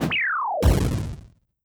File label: hand grenade lowbit